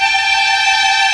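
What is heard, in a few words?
MAJOR TRILL G3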